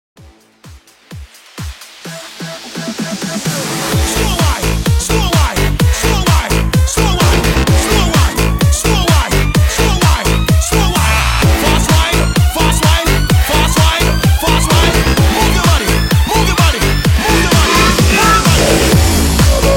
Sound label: DANCEHALL 128 BPM